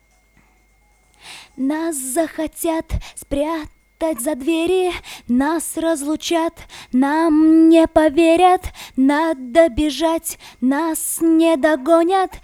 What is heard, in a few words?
VOX Couplet, acapellas